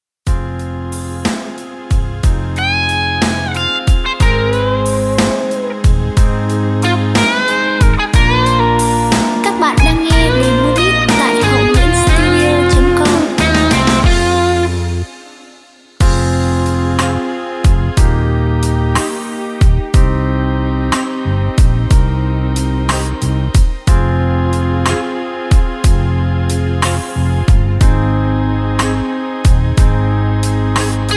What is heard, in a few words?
beat, playback